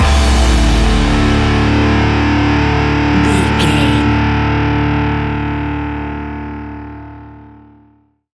electric rock stinger, A rocking music track with rock guitars, heavy rock drums and thumping rock bass lines; Heavy, loud, aggre